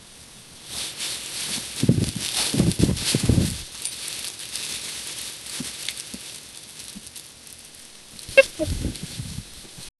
silence or soft wind; awkward

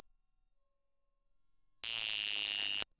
a sharp high pitched freq, glitch

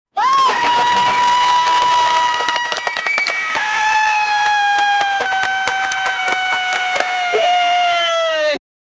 loud screams from hell

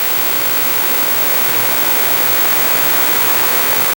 flickering static tv noise

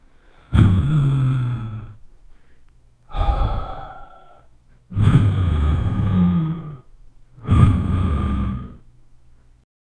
short vocal exertion of a

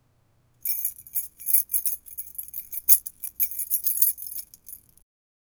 sound of keys jangling; everyday objects

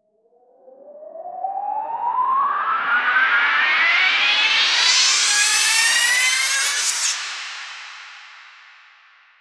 euphoric commercial riser

